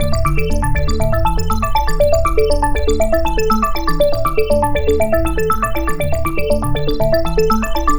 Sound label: Synth Arpeggio, Synths; Eclectic Electronica